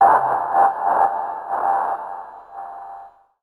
SPACE FX 140; SpaceSounds

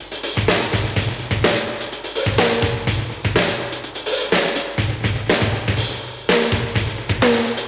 125 Classic Breaks, 125

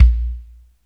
BD SOFT; drum; BASSDRUMS

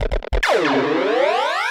Industrial 140

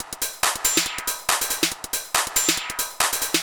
TECHNO BEATS 140

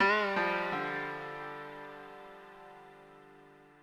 ethno, 125, A

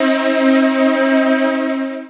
PAD

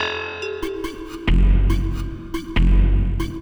TECHNO FX 140

